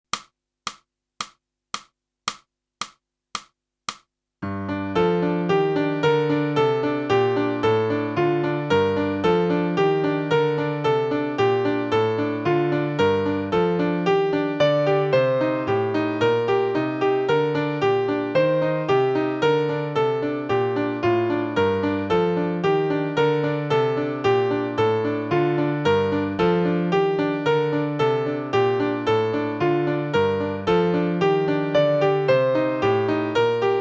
sax

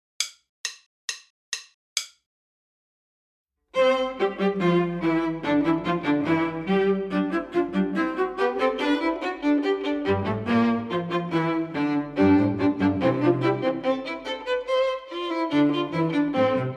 minus Violin I

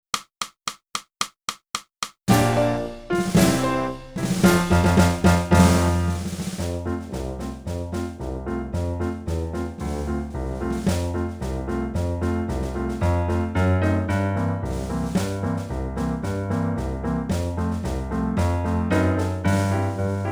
clarinet

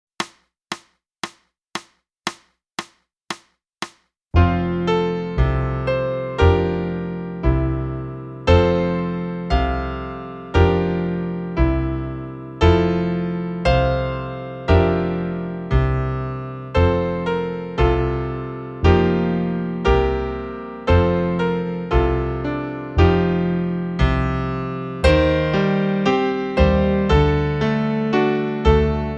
flute; Boosey Woodwind Method: Flute (Book 1)

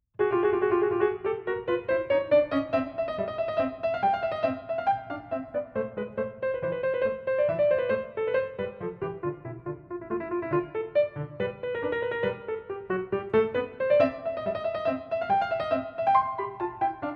piano

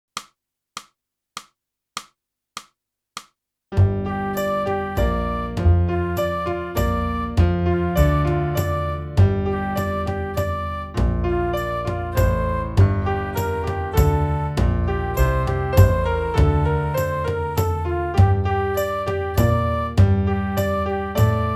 clarinet; boosey method

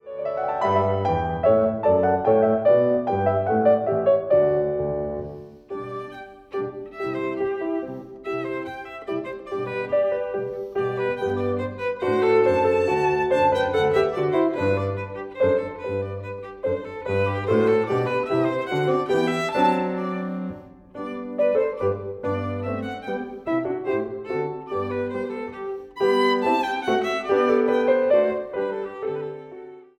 VIOLIN

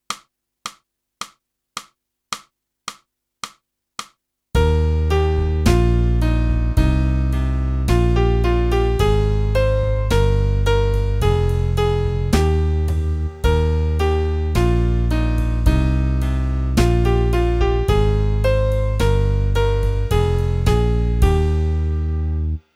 sax Track

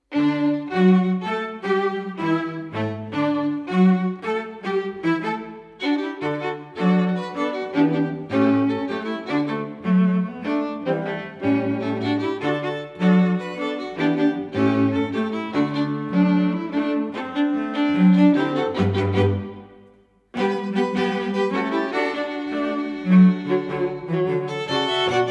RUMBA

